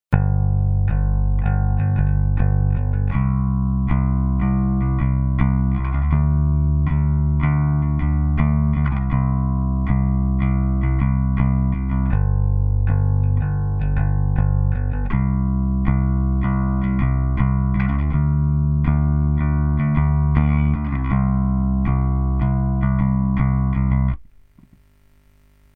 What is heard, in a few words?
Backing Track; chord progressions mastery